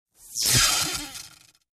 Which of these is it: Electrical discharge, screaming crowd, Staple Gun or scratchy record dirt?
Electrical discharge